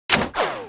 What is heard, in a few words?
Piercing; RPG Maker; Legend of Heroen v1.20